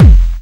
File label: normal hit clap